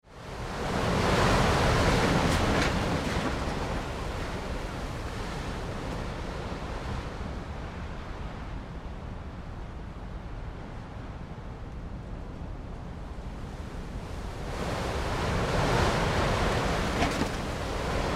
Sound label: Wind from Interior with Heavy Gusts; Some interior movements during wind squall